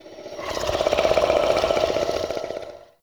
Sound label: Mockery Male Vocal Idle; Characters; Enemy; Vocals; Mockeries